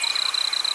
junebugs; graal2001

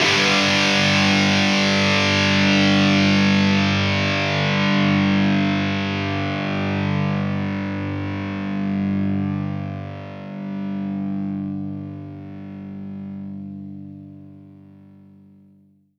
Guitar G (Power Chord Sustained); Sony; ACID Pro 7.0

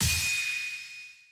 break layer

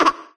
clucky laugh; There; ThereClient

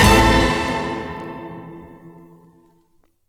Stab Orchestra Hit Vinyl; One Shots; Ableton Live 12 Lite; Core Library

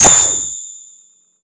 ice arrow; Zelda

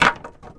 wood; Game Maker 8

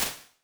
plate short, Small Rooms, IR Samples; Convolution

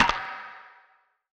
Wood Hall, One Shots Drums

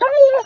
whine, dog; There; ThereClient

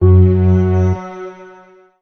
Phantasm F#3, Synths; Multisamples; Ableton Live 12 Lite; Core Library

